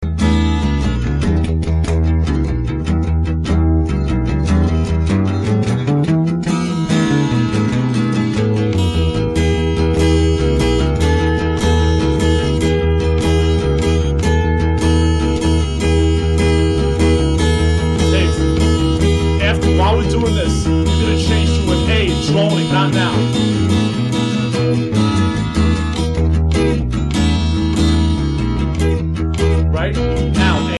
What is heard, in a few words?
cellar jam